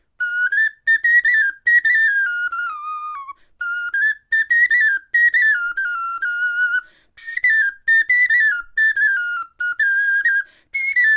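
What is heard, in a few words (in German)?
melodie, Knochenflöten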